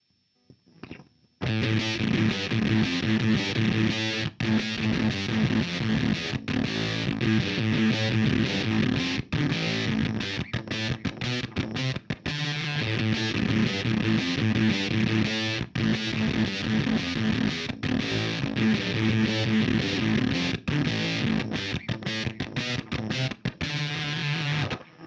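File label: fuzz scooped vintage, Filter Fuzz Effektpedal; Walrus Audio Kangra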